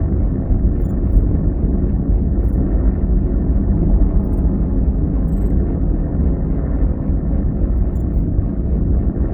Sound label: machine empire titan idle; Stellaris; synthetic dawn